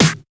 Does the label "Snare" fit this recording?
Yes